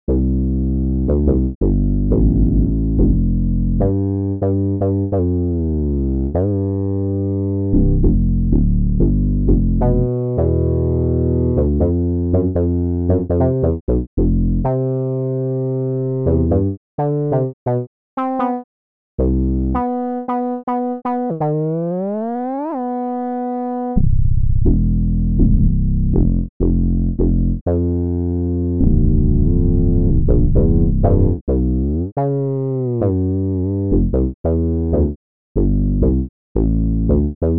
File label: Ultra Analog